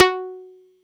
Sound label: Pizzicato f#3, Yamaha YX81Z